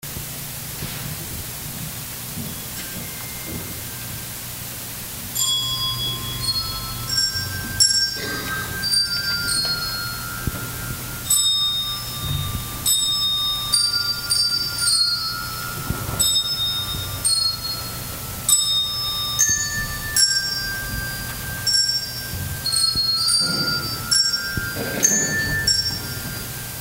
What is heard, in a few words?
Bell Youth Choir